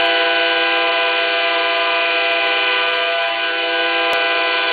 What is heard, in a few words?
wayside horn